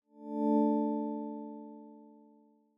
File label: notification ambient; Google; Material Design sounds